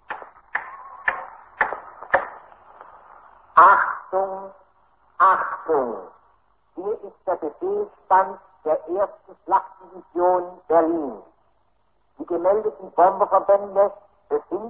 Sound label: Radio Airraid